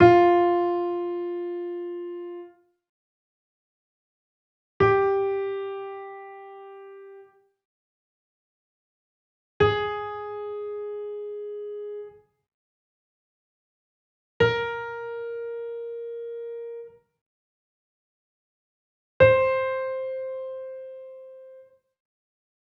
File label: Piano Fm Minor